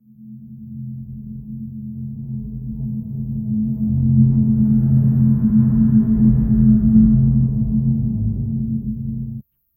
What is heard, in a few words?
low brooding ambient dron; minimalist